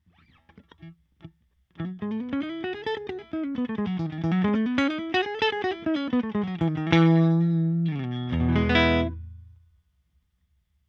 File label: Fender Twin Reverb jaguar solo